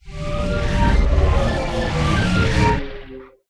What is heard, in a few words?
Warp In